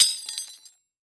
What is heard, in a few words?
Industry Tools Drop On Floor One